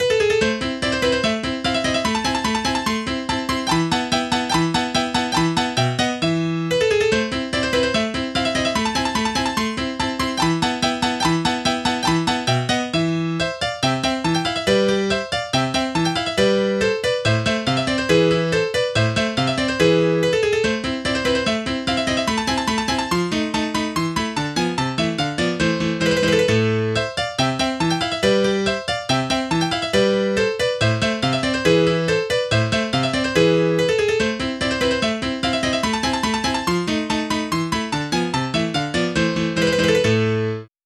bright yamaha grand; SpectMorph Evaluation #4

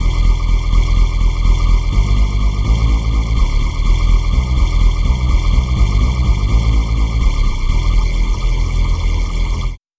halo pad bass

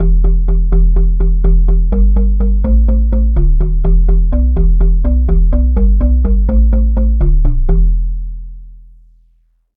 marimba bass

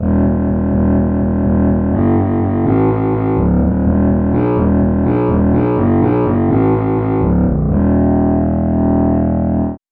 alto sax bass

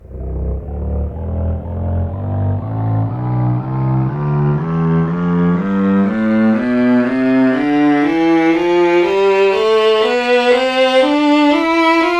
violin tonleiter

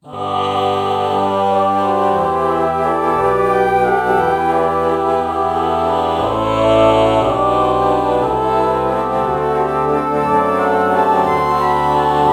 alto flute ah morph

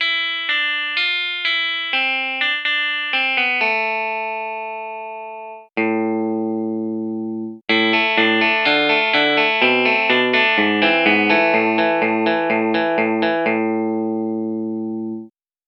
clean guitar

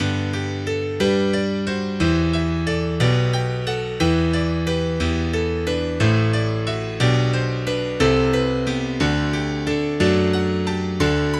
bright yamaha grand; SpectMorph Evaluation #4

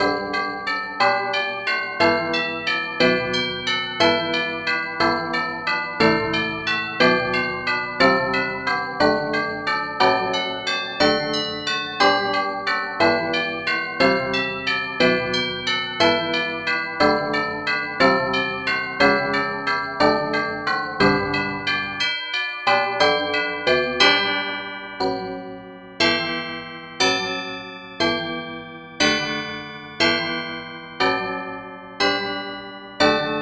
tubular bells

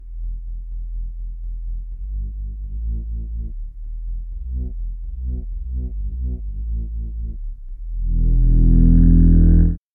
flute bass

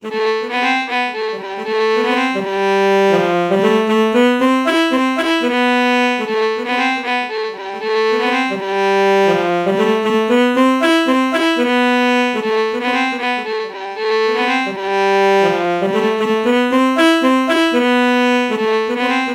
alto saxophone violin melody morph low

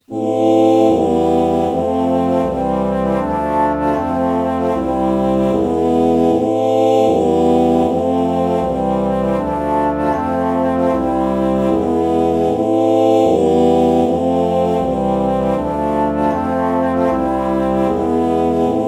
alto flute oh chord morph

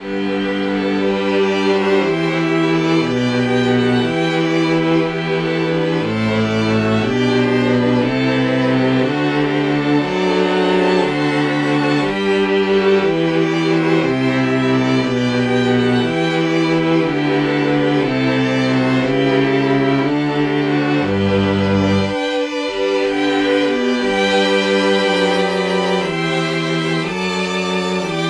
fiddle

